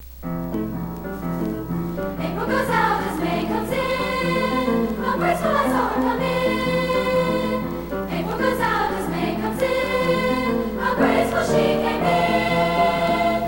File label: Three Spanish Ballads, chorus